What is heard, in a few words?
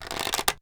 Longbow Pull Back; SteamVR; InteractionSystem